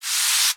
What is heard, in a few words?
Ocean Steam Blast; Warframe; Grineer Ocean